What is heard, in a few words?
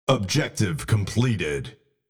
objective completed